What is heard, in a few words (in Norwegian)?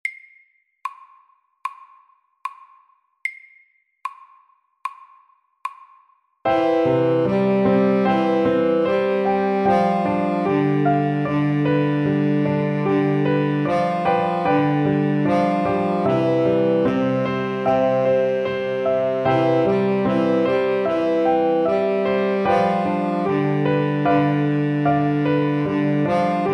Walesisk melodi SAKTE